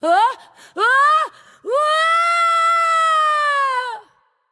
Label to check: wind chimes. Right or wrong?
Wrong